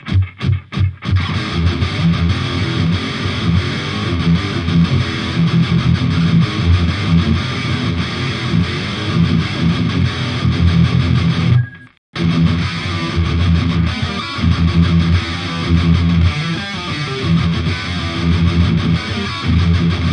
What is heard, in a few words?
boogie metal